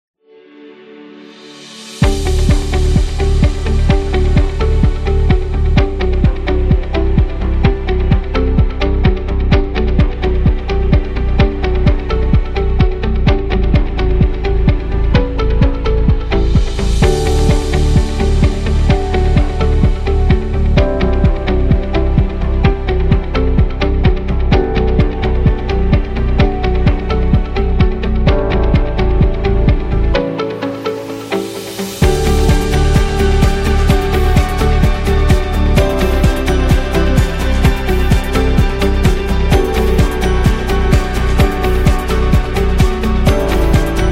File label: Corporate Meeting